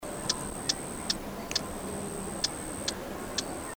Striated Prinia, birdcall; Prinia criniger; Bird Call Recordings

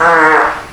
farting

